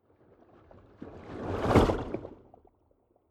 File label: whoosh abstract small